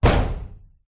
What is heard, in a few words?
impacts metal panel loose rattle thump thud; zapsplat